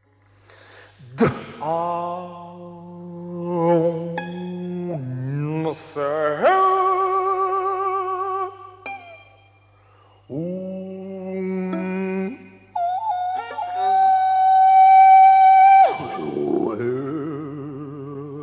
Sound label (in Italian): flauto